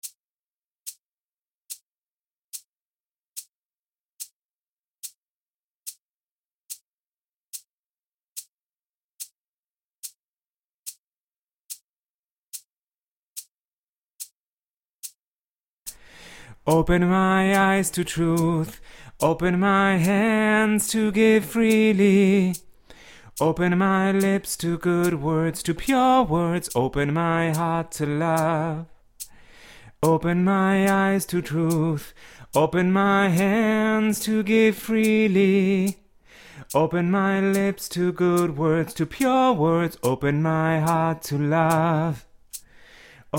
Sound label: open soprano